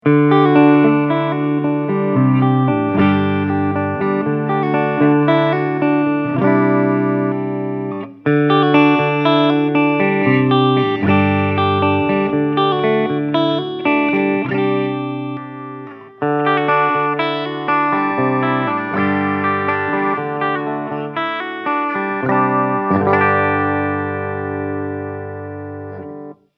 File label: Clean; Solar Guitars X1.6Canibalismo